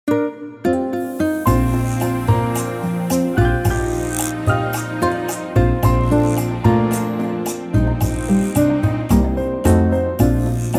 Bb latin

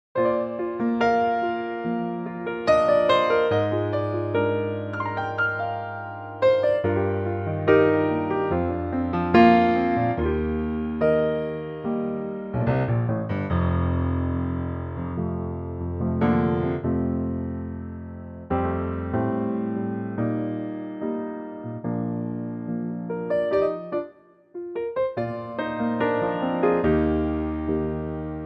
Bb piano

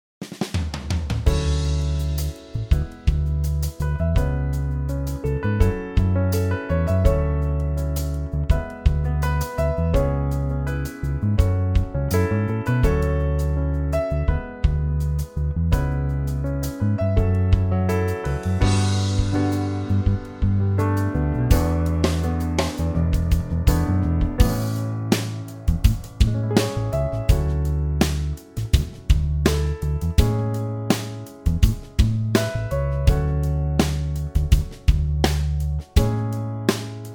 trio

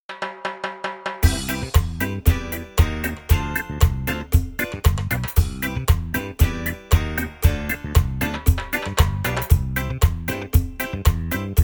reggae

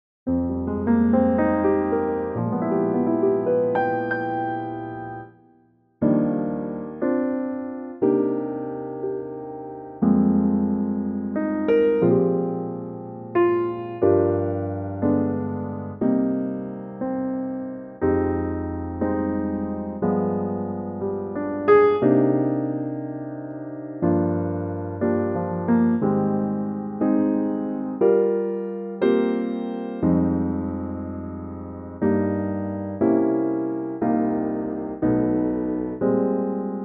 Eb piano

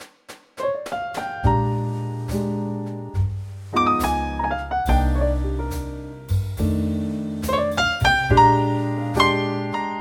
Bb Trio; key - Bb; vocal range - C# to Eb; Wonderful Trio ballad arrangement of this classic standard

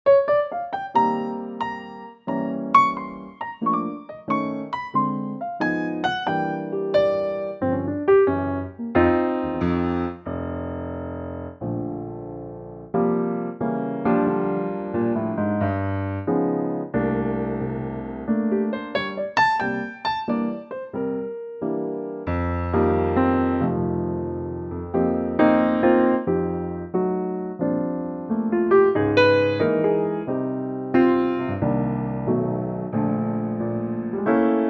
Bb piano; key - Bb - vocal range - Bb to Bb

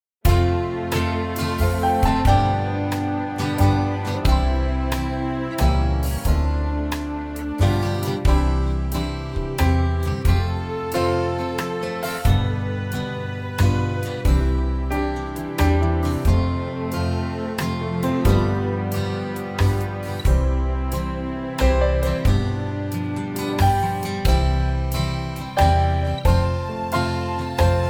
C NO LAP STEEL waltz; key - C; vocal range - C to C; Lovely 3/4 country arrangement; brighter in tempo

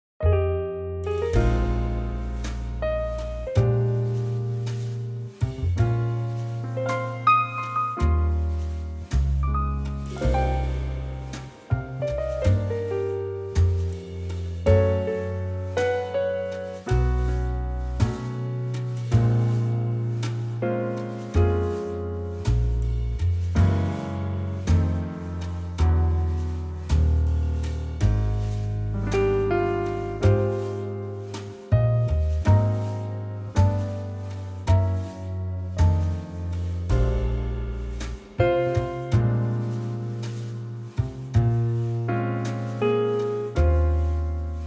jazz

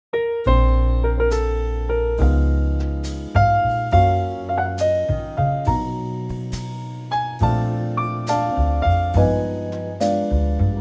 Bb trio

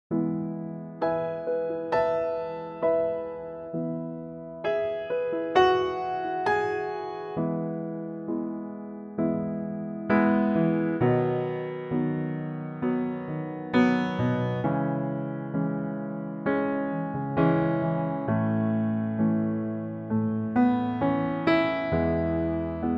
piano; Pianotrak

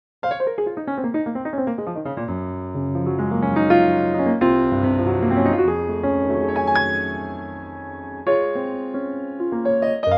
Db Eb Piano; Pianotrak; key - Db to Eb - vocal range - Db to Ab

